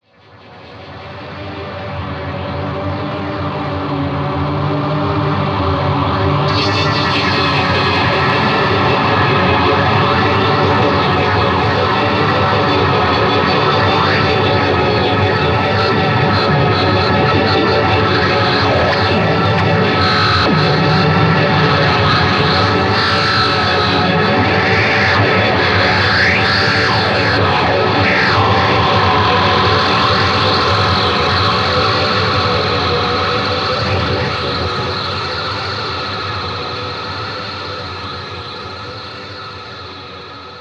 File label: Up Two Flights of Stairs